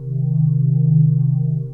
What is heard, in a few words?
Sci-fi Energy Glow Loop; SFX Kit; Sci-Fi Loops